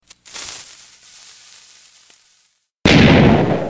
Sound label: Ssssss